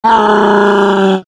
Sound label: eyeball mad; vo; tf2; garrysmod; halloween eyeball